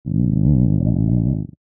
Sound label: Stomach gurgling and rumbling short